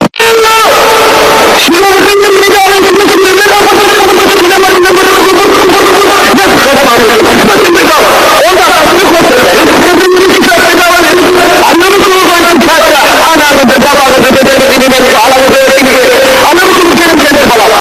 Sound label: bass